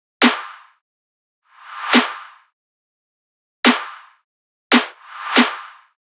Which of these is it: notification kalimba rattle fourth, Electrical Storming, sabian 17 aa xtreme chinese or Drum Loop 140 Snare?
Drum Loop 140 Snare